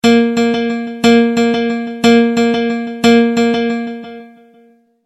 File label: Multimedia Tone-Thinking/Processing; Tones; Sound Design; McKinney Sound